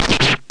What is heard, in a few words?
powerful energy gun